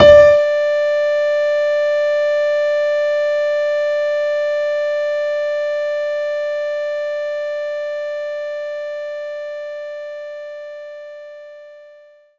Piano

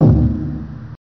Credit SFX; Shogun Warriors arcade gamerip 1992

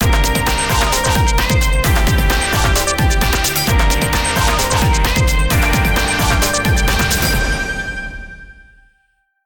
Goal Jingle; Gran Turismo Sport; Transmission Mode; Christmas Extra

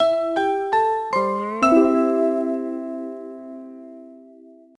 Jingle Happy; Petz Rescue Wildlife Vet; NDS

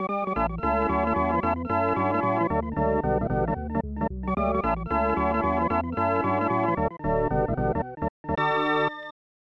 Fanfare Happiness; super bases loaded 3; snes

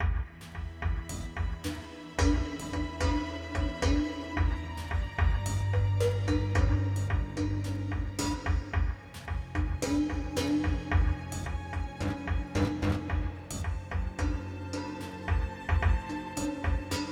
Creep Low

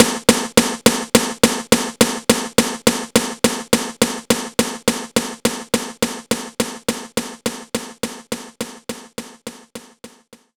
Dance Snare

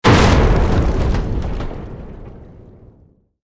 Crane Impact Water Tower; The Unfinished Swan

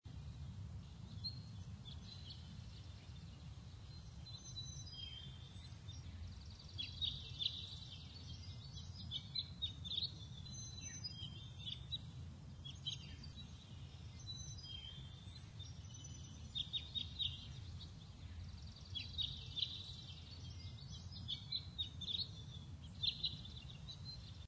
ambient bird; game rip; mabinogi

